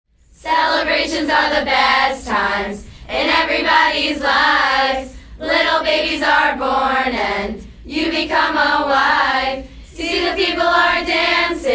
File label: cheers